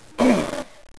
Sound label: zombie pain